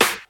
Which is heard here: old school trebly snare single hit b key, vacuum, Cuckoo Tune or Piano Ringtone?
old school trebly snare single hit b key